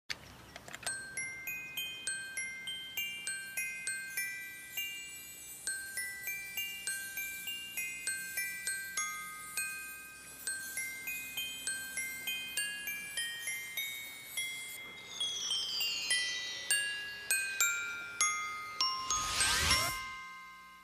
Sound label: BGM, Star locket; Sailor Moon DiC